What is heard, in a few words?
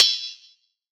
large room crash d key